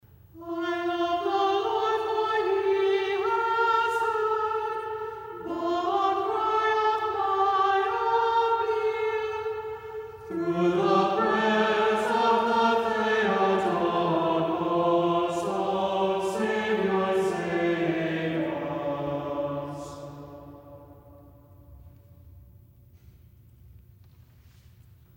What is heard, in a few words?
Palm Sunday First Antiphon; DivineLiturgies